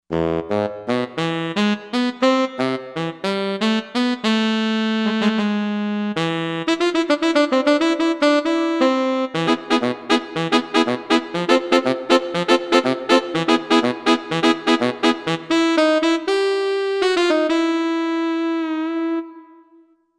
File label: Tenor medium